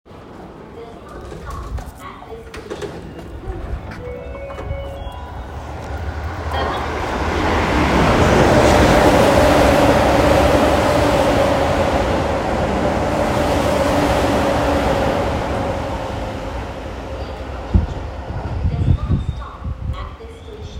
京王線柴崎東通過

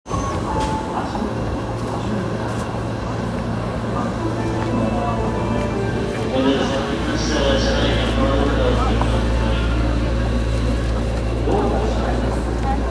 千代田線新御茶ノ水東, train sounds

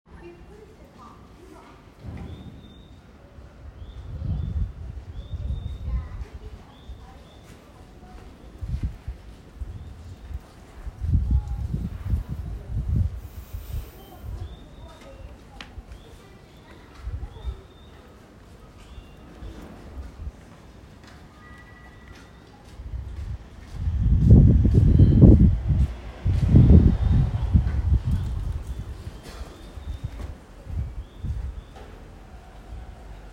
京王線柴崎東接近

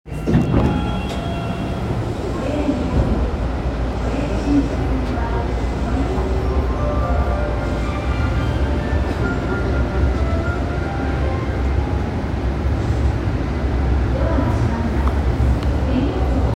半蔵門線神保町東, train sounds

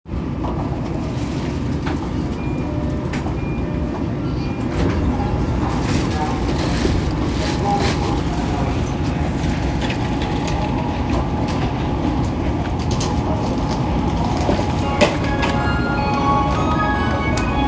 日比谷線上野北, train sounds

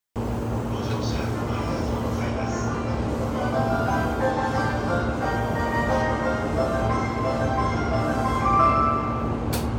JR京浜東北線神田北, train sounds

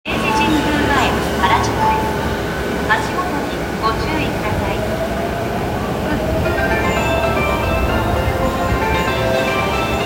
千代田線明治神宮前西, train sounds

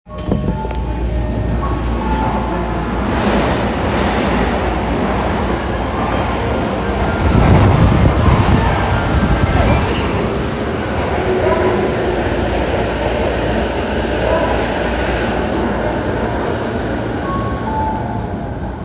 千代田線湯島東, train sounds

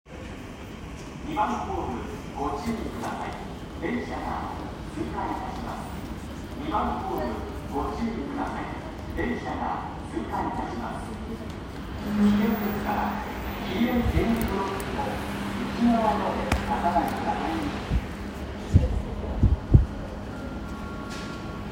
通過, train sounds; 小田急線千歳船橋東

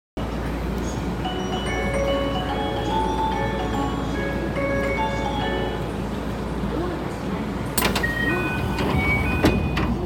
野田発車, train sounds

